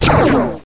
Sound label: PLASMA; TNP demo